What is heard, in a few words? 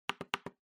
Trackpad Click L Double